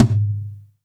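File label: TOM soft; Zero-G; Chemical Beats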